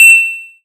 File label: bows hit player; mcl bows